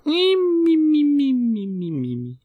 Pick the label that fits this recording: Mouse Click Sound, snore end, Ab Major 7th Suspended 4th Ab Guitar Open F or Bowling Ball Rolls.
snore end